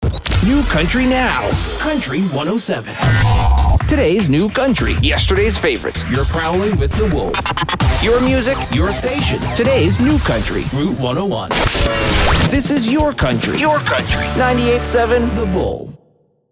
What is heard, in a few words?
country